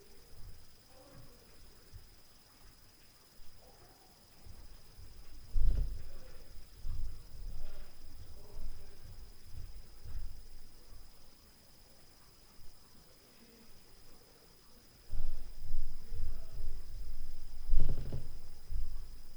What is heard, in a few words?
Upstair LF Noise; Night Noise